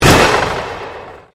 Convolution Pulse

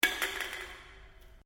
Can Aluminium Inside Drop; various metal cans; Drops; Objects

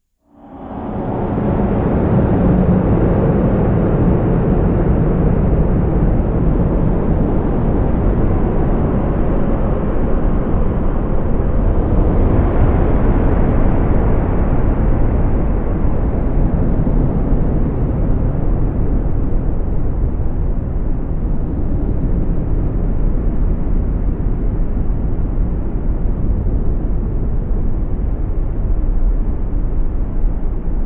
Drone Devil Wind; sound design; Drone Sound Effects

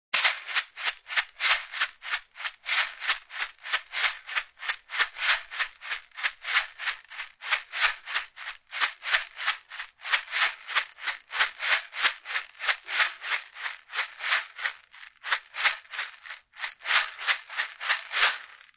Shaker; Shaker (Sound Effects:Musical)

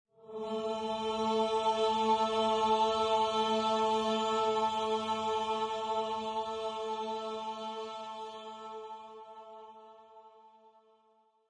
French horn fadeout loop Dm 160 bpm.; Choir Loops

